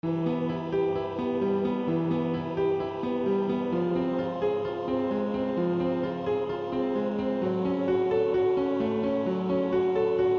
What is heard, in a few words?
Classical Test